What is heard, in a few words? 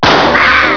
bang ahhh